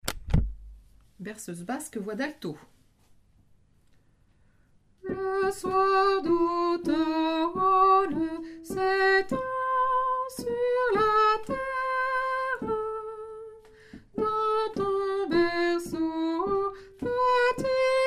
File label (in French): berceuse basque alto